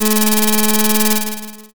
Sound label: slot spin